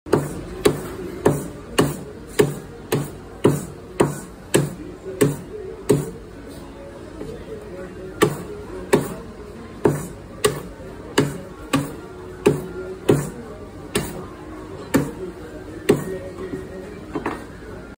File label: cleaver